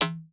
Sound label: Perc Reso, Vermona Mono15 Drums